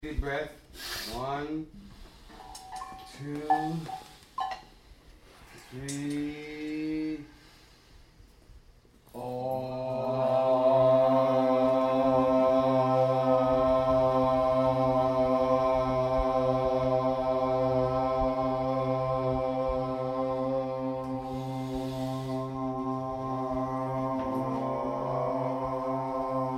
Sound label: OOOOMMM